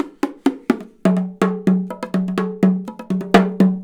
125 TIMB, Indian Traditions; USB Soundscan vol.45; AKAI

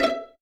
Violins pizzicato, E4

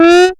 syn f#, Dance Synths; ProSamples vol.37

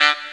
Sax; DRUNK DOG

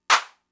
Clap; Fricke MFB-522

